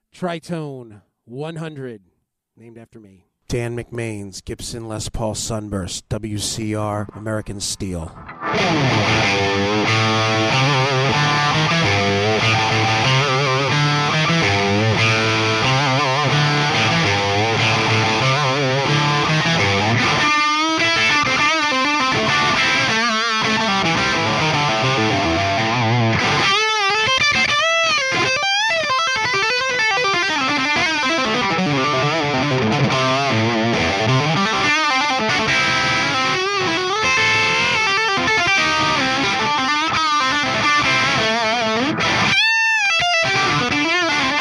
tritone bridge

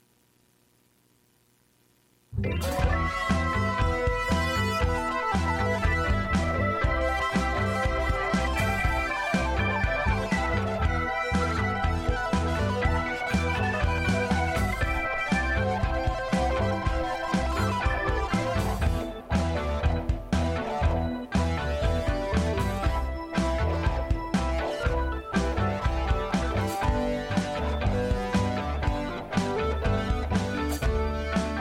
rocking song

